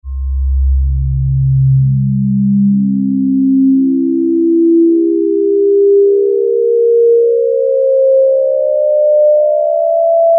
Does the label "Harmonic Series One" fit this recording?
Yes